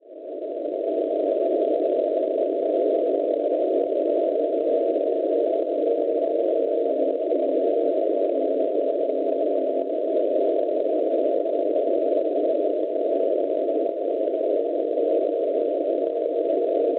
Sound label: NDB, 373 kHz; LCT; Le Luc; Francia; Schwarzsee